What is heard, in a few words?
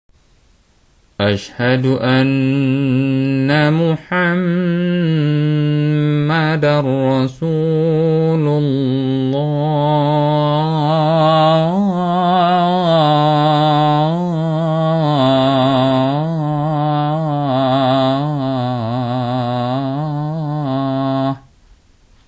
Adzan